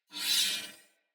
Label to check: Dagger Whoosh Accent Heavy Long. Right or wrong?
Right